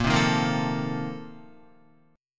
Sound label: A sharp Major 7th Suspended 2nd Suspended 4th, Guitar, chord; A#M7sus2sus4; strummed; JGuitar